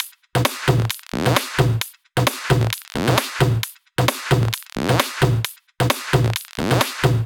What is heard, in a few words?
132BPM TomTom Kit